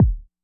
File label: swing kick; Hiphop